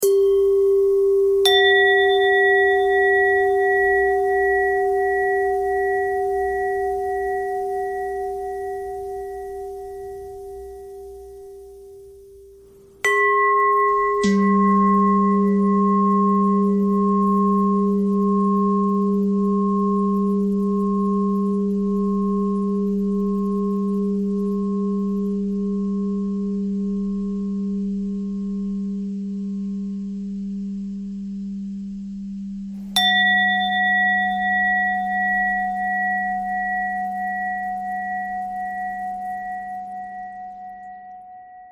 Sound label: bell bell thread noise